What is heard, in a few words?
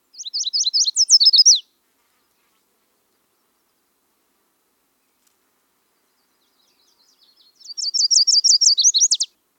Setophaga petechia; Chordata; LandBirds